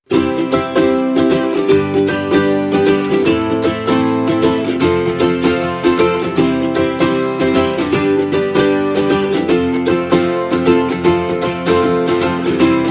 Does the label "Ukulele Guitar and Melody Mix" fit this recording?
Yes